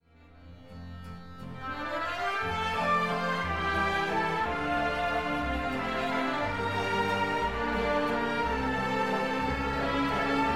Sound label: accordion fantasia